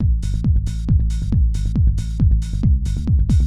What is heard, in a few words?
d loop; smash